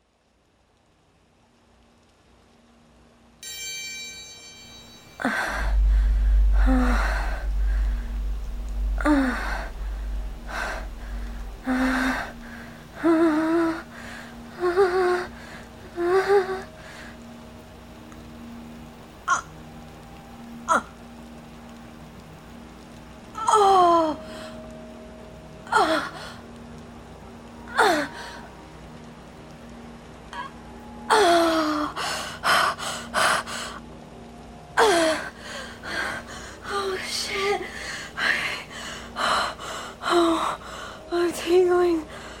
F4A Orgasms soundscape rain; actually 36 orgasms

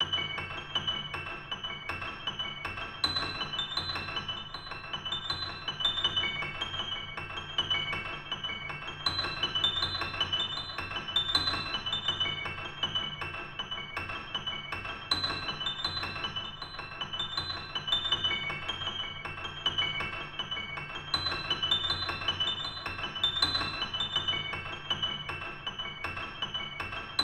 Melancholy piano

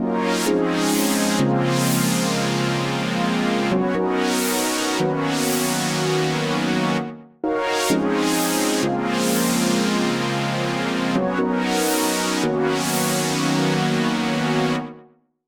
pads or chords or something; 129BPM; Pony Fan Music Remix Stems

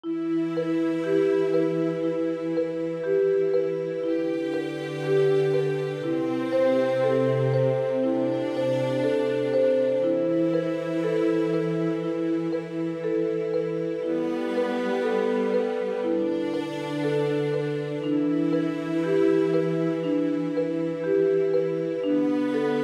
mysterious soundtrack

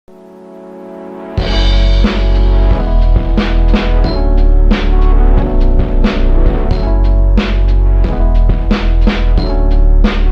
Lo-fi Hip-Hop

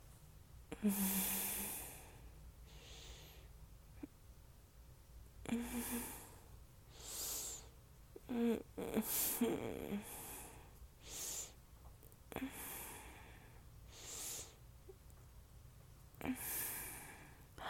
Not much talking, Whimpers, Tarkgrowls; F4A; Rainy With a Chance of Tarkgrowls; Growly orgasms

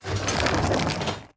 Monster; Zenless Zone Zero

